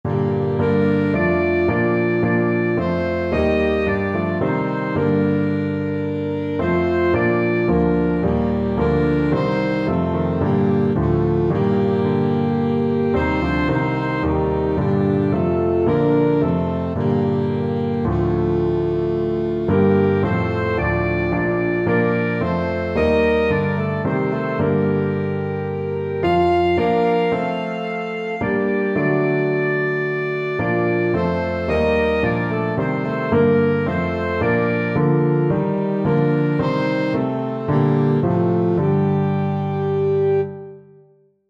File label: alto saxophone duet